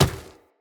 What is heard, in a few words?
Infested Medium Run Dirt, Footsteps